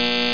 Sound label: BUZZ; slimshow